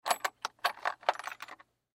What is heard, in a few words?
Gun Bullets In Box Pick Up Bullet Movement; Gun Shot; Warfare; Gun Shots; Blastwave